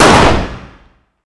fire dist 9x19 pistol int; gmod; arccw uc commons attachments

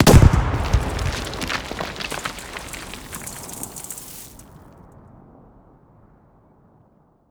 close, explosions; gmod; lvs framework

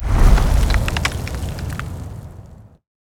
debris; gmod; arccw uc commons attachments

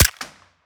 fire, arccw sound; 22 sup; gmod; arccw uc commons attachments

